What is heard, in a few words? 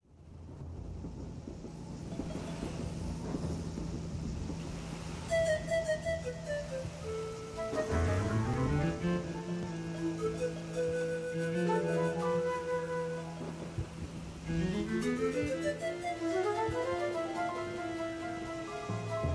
Elise Fantasia Orch